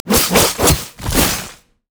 renekton recall; renekton volcano god

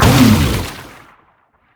stun; LoL; velkoz

